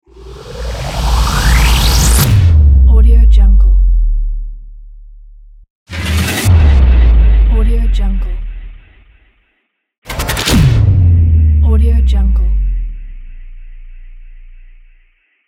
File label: futuristic whoosh to sub hit